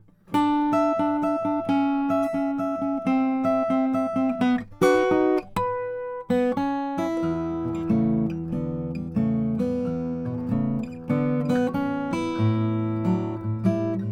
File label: mcalister lucas 13 fret cuban mahogany